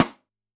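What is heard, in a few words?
Snare Stick; drum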